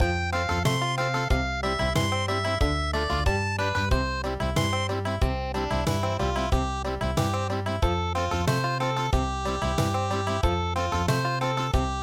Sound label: Country, traditional